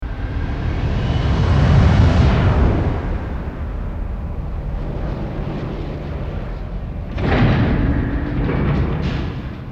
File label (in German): Electro Sound schnipsel